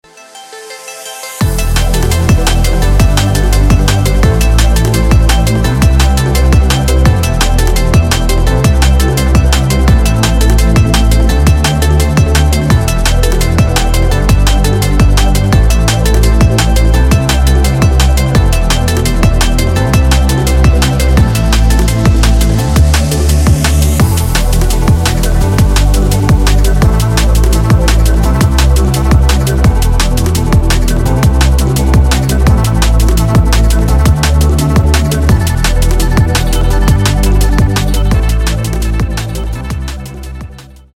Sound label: drums blue bells